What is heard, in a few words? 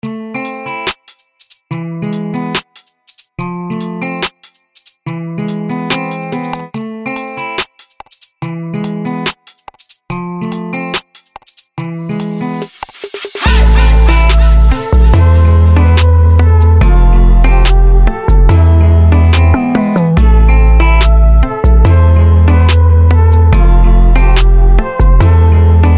drilled